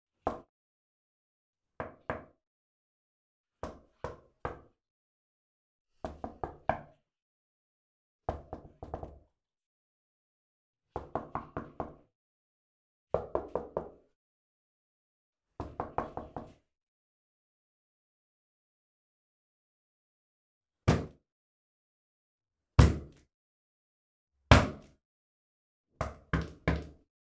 PORTE STUDIO BOIS VITRE TOC TOQUER

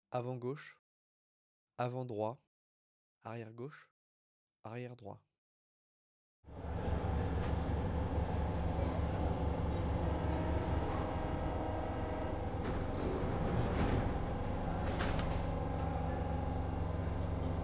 AMBIANCE MARSEILLE METRO PRADO QUAI ARRIVEE DEPART METRO PASSAGES VOIX; Quadro

